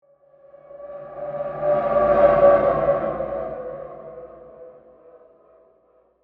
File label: Whoosh Ghostly Howl, Horror, Haunting, Pass By; Ghosts; BlastWave